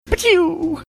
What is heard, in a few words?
making sharp shooting sound